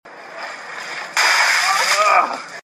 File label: Groan; Robert Carlyle; Icebucketchallenge